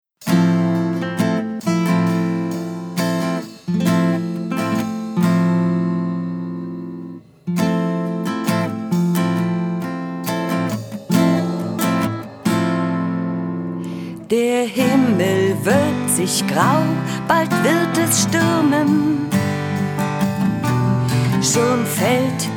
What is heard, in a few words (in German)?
Trostliches Lied